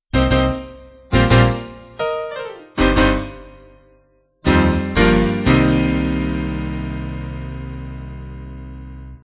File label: piano jazz-pop end